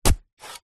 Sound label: metal shovel spade single dig grass soil; industrial; zapsplat